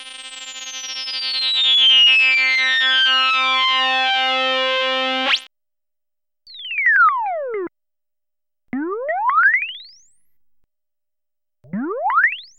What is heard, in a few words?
BLEEP UP